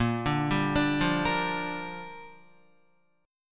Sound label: Bb 6th, Guitar; arpeggiated